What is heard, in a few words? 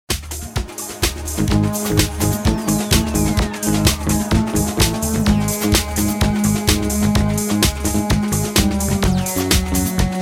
rainforest instrumental